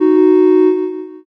horn

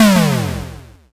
explosion m

